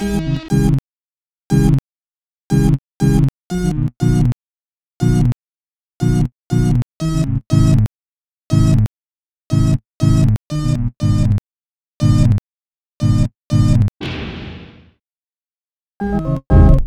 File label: Singlecycle 1k